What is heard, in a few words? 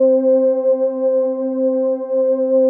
505, String Machines